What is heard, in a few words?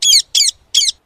Parrot SMS Sound